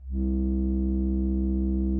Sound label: Instrument